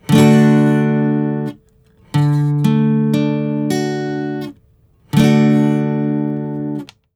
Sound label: Major or Minor